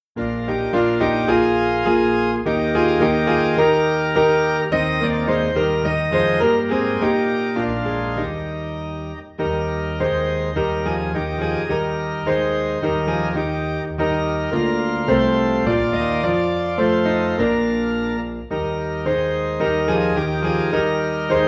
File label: Piano and Organ in E Flat; Lord Dismiss Us With Thy Blessing